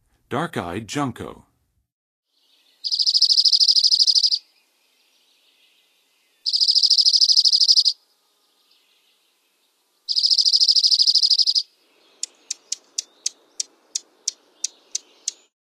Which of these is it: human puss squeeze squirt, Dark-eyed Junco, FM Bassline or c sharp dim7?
Dark-eyed Junco